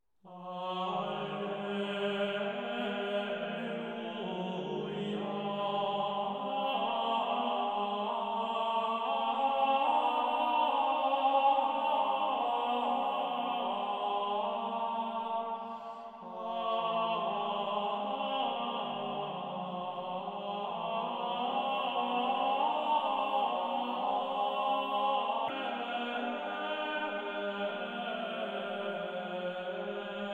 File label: Organum Alleluia